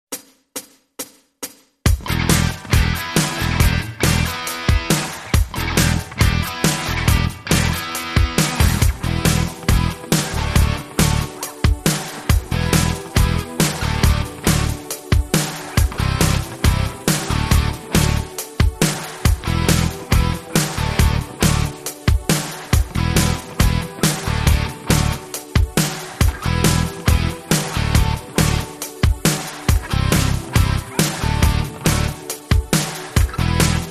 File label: solo